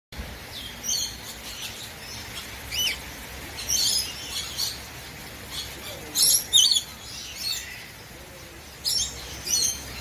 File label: pesquets parrot psittrichas fulgidus; burung; birds